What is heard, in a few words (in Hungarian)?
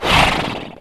fujtatas; The Wild Thornberrys Movie